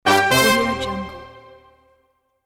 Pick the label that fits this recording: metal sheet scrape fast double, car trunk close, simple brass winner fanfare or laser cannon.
simple brass winner fanfare